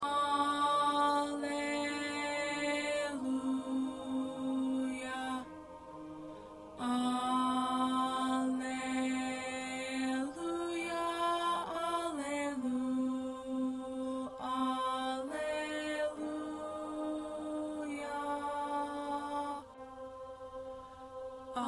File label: 1st Tenor